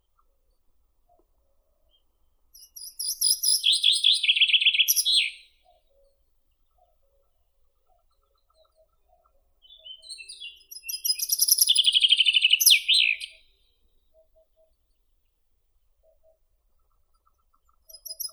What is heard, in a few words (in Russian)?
zyablik fringilla coelebs, zvuki prirodi